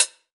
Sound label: HH, Drum Mashines; HIHATS 1; EdgeSounds